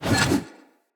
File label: One Handed Axe Elite Spin Whoosh; Weapons; Enemy; Axes; Sinecure One Handed Axe Elite